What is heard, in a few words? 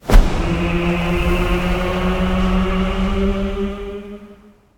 angelic conjure; Smite; Thanatos